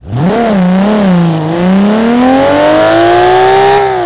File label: first, vehicles; gmod; tdmcars; skyline r34